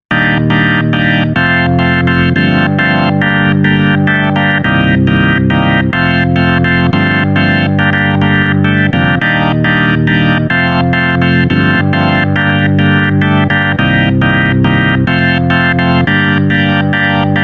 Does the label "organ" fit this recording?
Yes